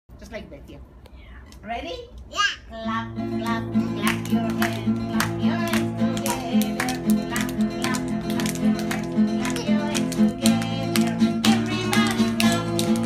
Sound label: laughter